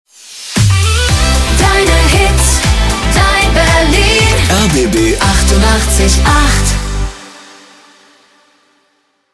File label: Jingle Short Vox